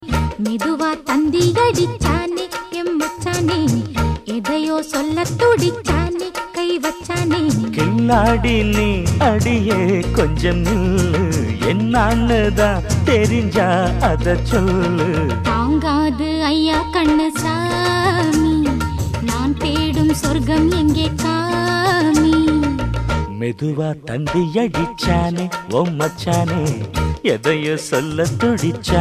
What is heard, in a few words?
Tamil Sms